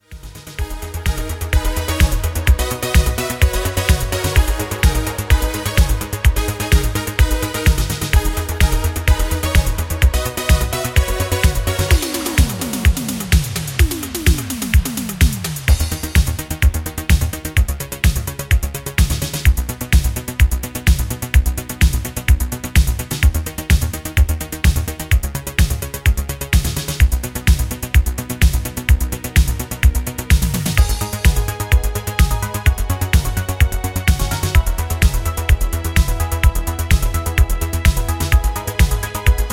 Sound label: Instrumental